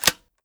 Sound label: foley shell insert, weapons